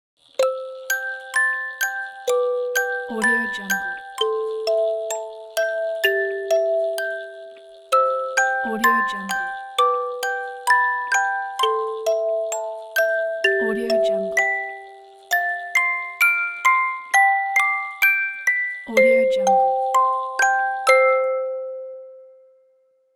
music box

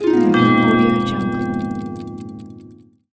magic timer

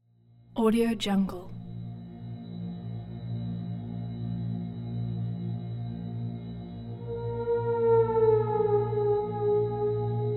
heart beating stress night

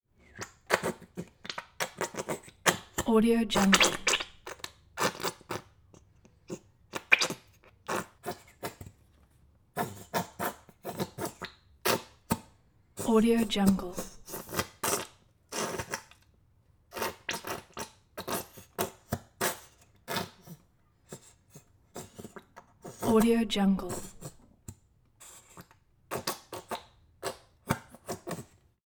squeeze bottle wet fart; prosoundfx

